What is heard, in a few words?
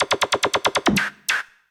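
SNARE ELECTRO 140, Techno Drum; Techno2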